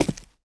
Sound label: step, Players